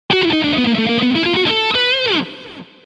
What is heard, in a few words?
Lick